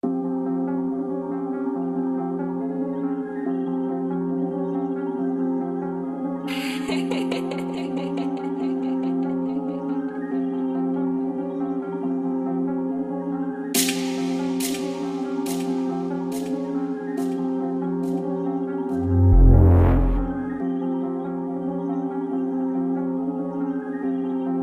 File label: drill type beat; TRAP